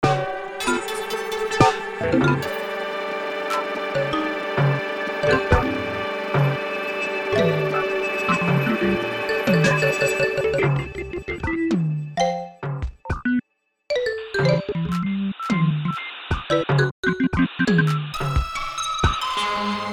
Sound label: Dirierious Jazz